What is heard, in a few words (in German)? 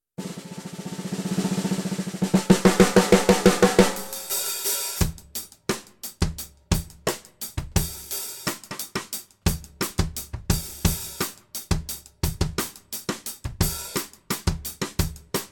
Supralite Mittel Offen